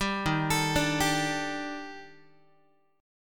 Eb Major Sharp 11th Eb Guitar; EbM#11; arpeggiated; 10,11,12,12,11,x; JGuitar

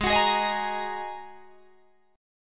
G sharp 7th Suspended 2nd G sharp Guitar Drop D

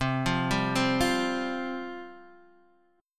C Suspended 4th C, Guitar, Half Step Down; Csus4

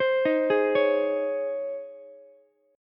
Db Major 7th Suspended 2nd Db Ukulele; DbM7sus2 arpeggiated; JGuitar

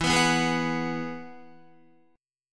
E Major Add 9th, Guitar, down; strummed; JGuitar

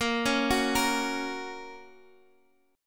A sharp Minor Sharp 5th, Guitar Half Step Down

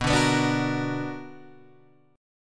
B Minor Major 7th, Guitar, Open G

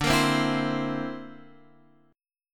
D 7th Suspended 2nd Sharp 5th, chord; D7sus2#5 strummed; Standard

